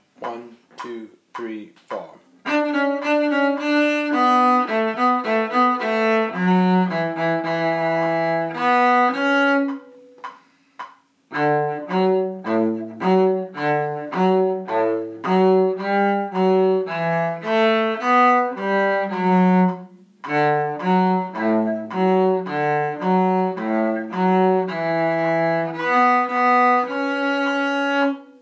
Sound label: cakewalk cello